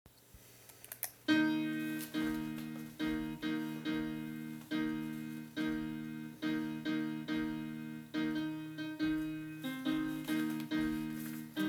Sopranos

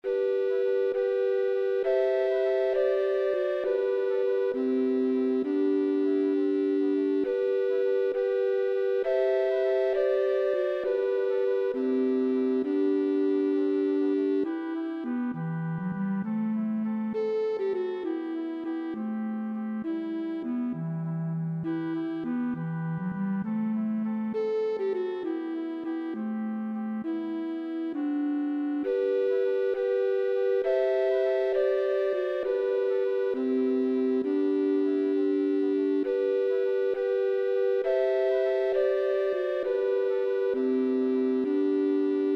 PIANO; Canto a mi barca

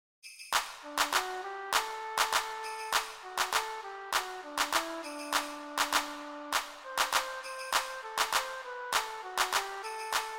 Tambor; Canciones de cuna

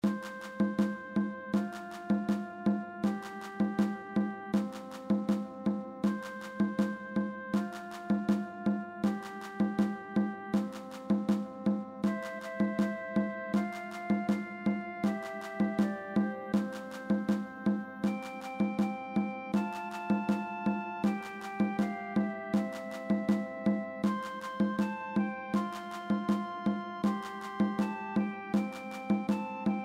Banuwa io tambor

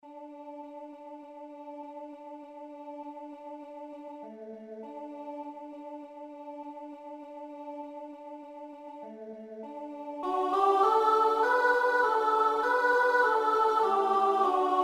1 VOZ; Huachito torito

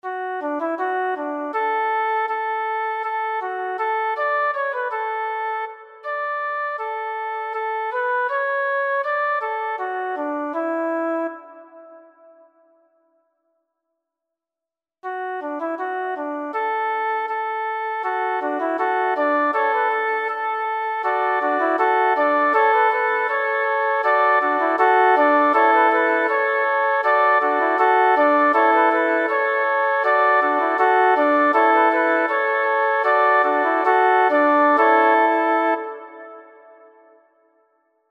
Canon 4v; Jubilate Coeli

